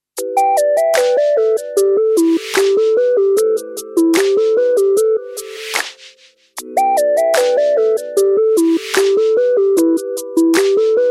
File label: Attractive Tone